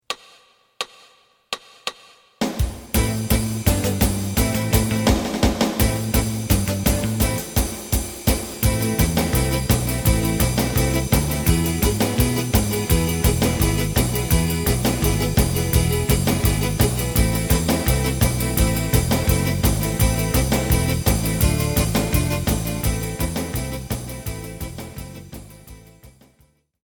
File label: lead